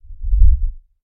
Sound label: Subwoofer Sngl Whoosh